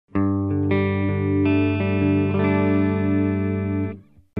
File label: Audio-Technica AT Pro37 Clean (Neck), guitar